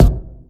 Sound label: present bass drum single hit g sharp key, kick drum sample tuned to the G# note